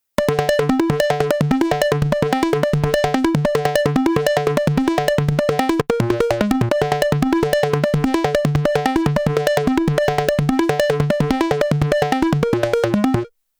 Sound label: Chandler TG 1 Seq. THD Lim